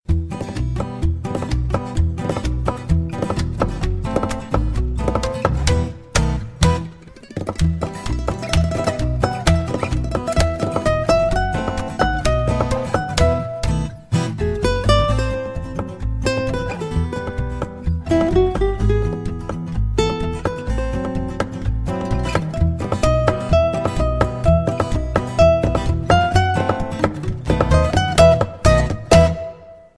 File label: love bgm; chandras and rajan